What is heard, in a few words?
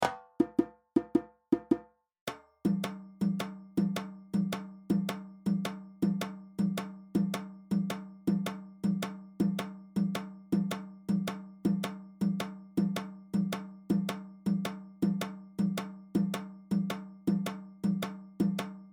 Kenkeni + Djembe; Djembe 1